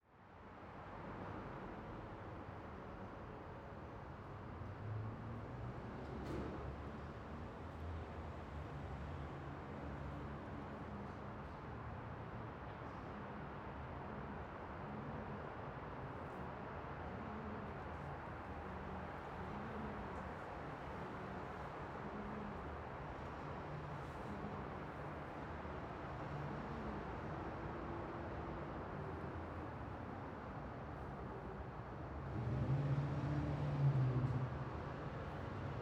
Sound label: Ambience Parking Garage 180D; AdobeCC